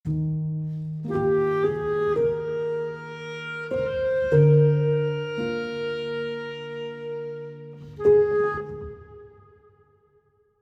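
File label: 112bpm Eb major; 16beats; chicory a colorful tale; ps4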